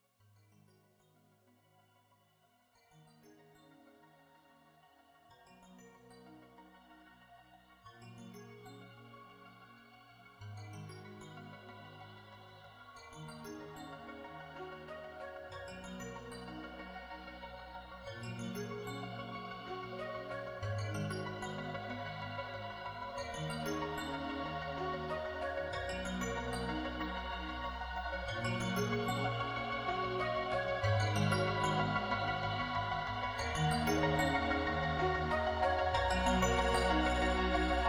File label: Instrumental Mix Test